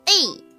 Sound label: polka